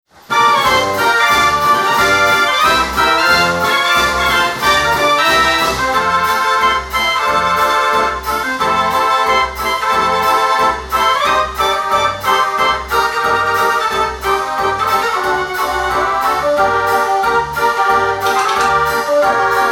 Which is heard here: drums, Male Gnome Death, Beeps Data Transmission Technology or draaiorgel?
draaiorgel